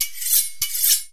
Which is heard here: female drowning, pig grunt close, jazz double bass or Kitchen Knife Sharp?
Kitchen Knife Sharp